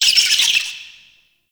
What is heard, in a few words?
Interior Spider Chatter; Ambience; Warframe; Duviri; Murmur